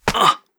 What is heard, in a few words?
death sound effect